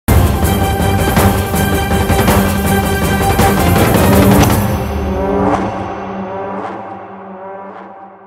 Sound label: BGM; RRR